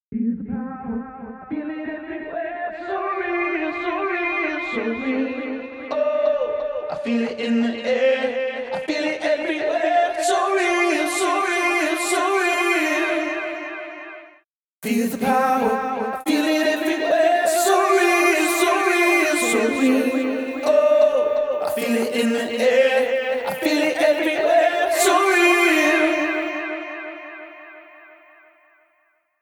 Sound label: Acapella Vocal; 130.0 BPM